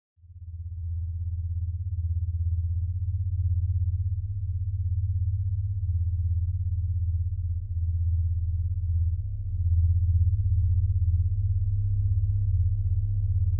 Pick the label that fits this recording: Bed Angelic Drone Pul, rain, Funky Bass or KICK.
Bed Angelic Drone Pul